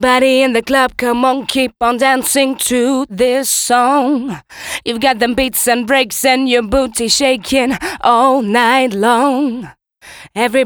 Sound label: Keep On Dry, vocal